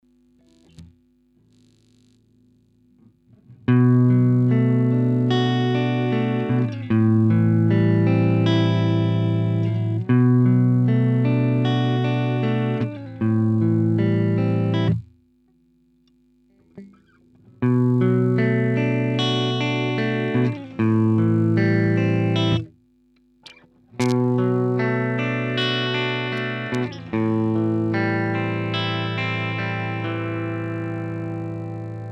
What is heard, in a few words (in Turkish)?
cizirti fender